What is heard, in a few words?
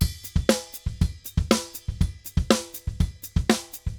DRUMS, DRUMS SAMBA; Best Service ProSamples vol.14; AKAI